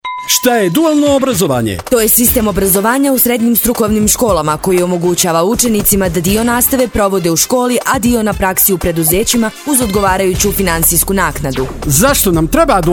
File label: radio jingl